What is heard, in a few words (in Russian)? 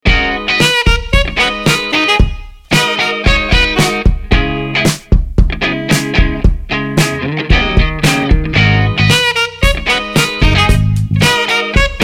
zvonok; Tele2